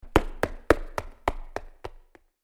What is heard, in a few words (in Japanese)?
足音